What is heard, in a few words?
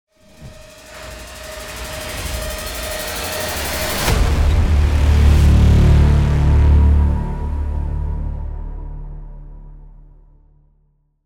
power boom logo